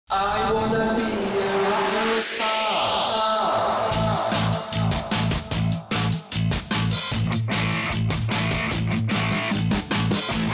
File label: Iron guitar